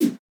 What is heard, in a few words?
quick menu appear